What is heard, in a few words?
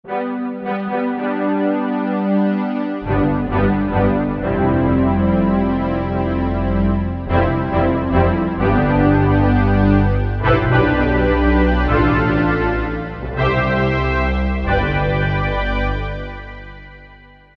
Overture, Korg M1, Factory preset examples